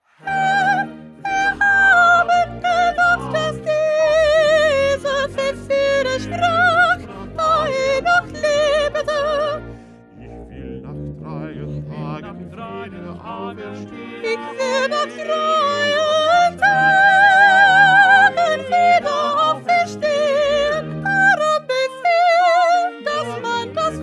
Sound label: 1st Soprano